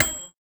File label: microwave; PonPonChun